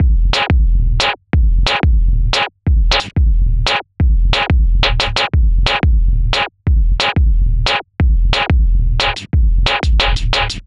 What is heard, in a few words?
gulp 090bpm; Zero-G; Downbeat Dance Grooves